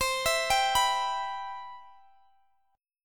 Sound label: C Major 7th, C, down, Guitar, DADGAD